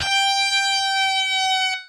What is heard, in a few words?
bend G6